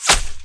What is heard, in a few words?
water bubble